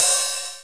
open hi hat; Greytsounds; Scott Peers Sound Engineering

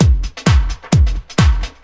groove, DRUMLOOPS

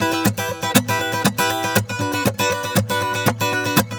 GUITAR SALSA

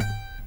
ACSSFTFNGR, Guitar; 90 s Sample CDs; Quantum Leap - Guitar & Bass

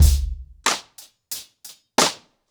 90BPM, ACOUSTIC DRUMS

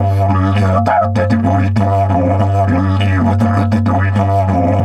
DIDGE 100BPM, Didgeridoo

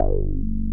JUNO 60 G2; Hollywood Edge - Giorgio Moroder Rare Synthesizer Collection

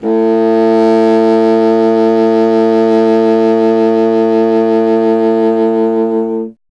C. Bassoon, solo instruments

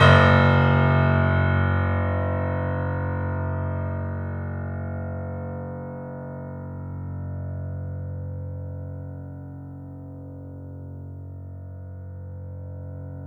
F#, piano sustain; InVision Interactive; The Piano

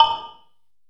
FX BELL, PERCUSSION; AKAI S6000 CD-ROM - Volume 5

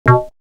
Shot; 90 sSampleCDs; Coldcut's Kleptomania VOL1

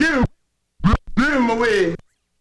Scratch

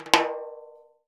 100 TIMBA, Modern Percussion Loops; Soundscan vol.56; AKAI

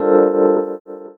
Chords Tones; Equipped Music; Loungin House

